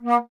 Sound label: Alto Flute